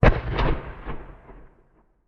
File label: Weapon Cannon Fire; AdobeCC